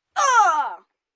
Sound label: Impact Ahh, Grunt, 1 boy; Pain; VoiceBox